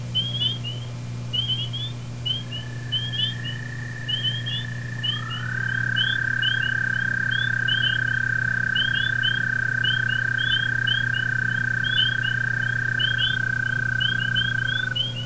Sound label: Eastern American Toad call